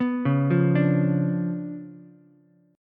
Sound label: C sharp 7th Flat 9th, Ukulele, C6; arpeggiated; chord